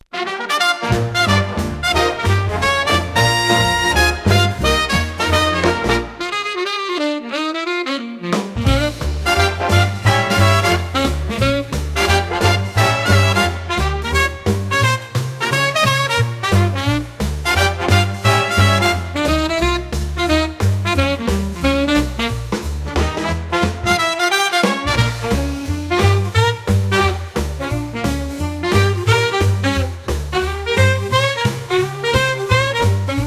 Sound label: through the night 88bpm, Big Band Swing